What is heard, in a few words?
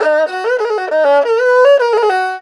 ERHU LICKS; Best Service ProSamples vol.52 - World Instruments 2; AKAI